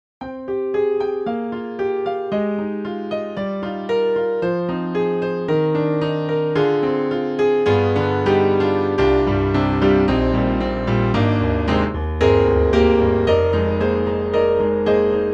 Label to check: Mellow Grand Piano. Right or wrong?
Right